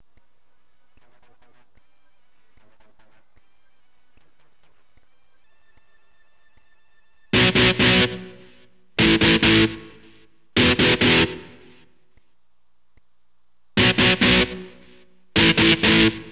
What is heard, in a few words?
E GTR POWER CHORD